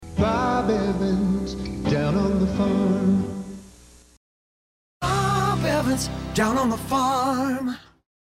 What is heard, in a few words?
JINGLE; Bob Evans; Speaking Human podcast; brand jingles